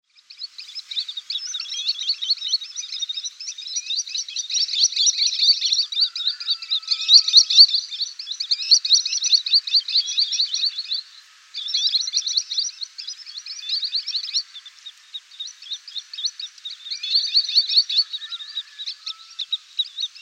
Skogssnappa

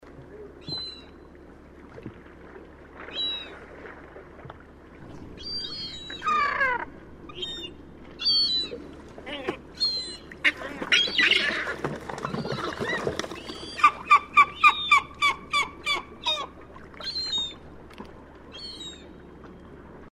medelhavstrut

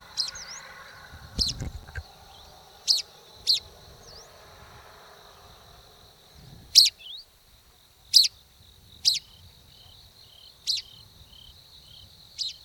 större piplärka

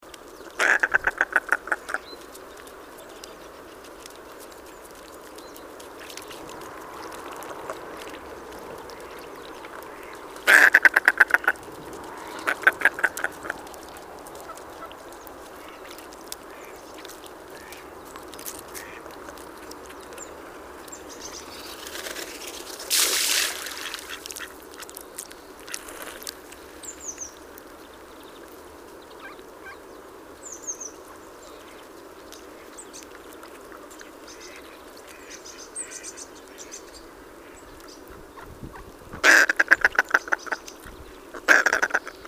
Storskrake

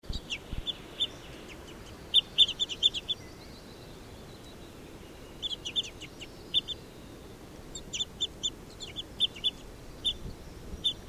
Större korsnäbb

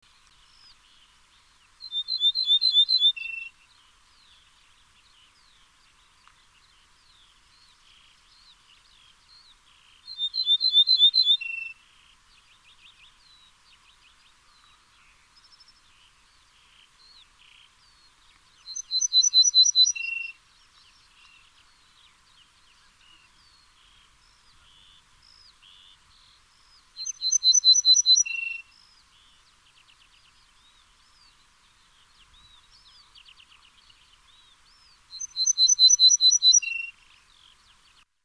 Ortolansparv

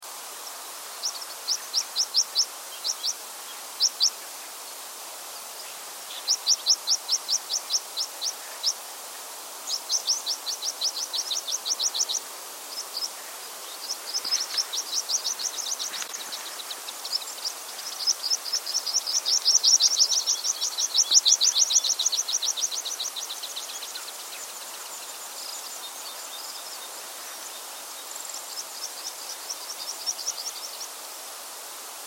Angspiplarka

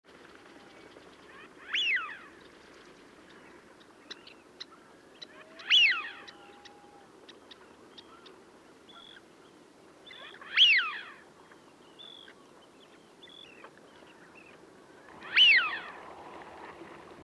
Blasand; Anas penelope; Wigeon; Patrik Åberg

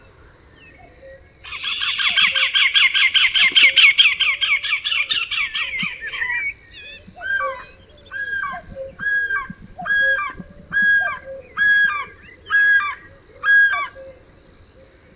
Skatgok

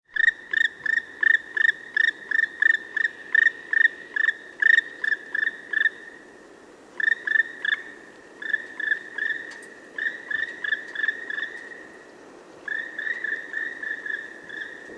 Spillkraka

